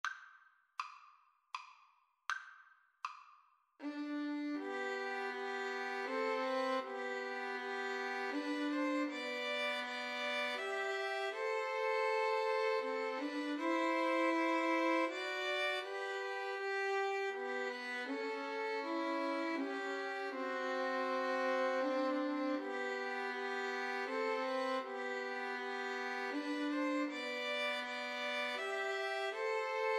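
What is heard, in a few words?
away in a manger 2 Violins Cello karaoke; Slow Playalong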